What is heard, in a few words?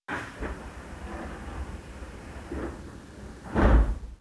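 door close left, Train; OpenBVE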